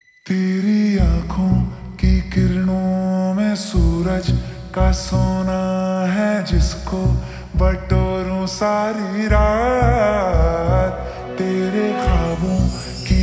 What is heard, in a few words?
Ringtone Slowed Reverb